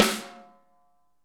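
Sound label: snare ambient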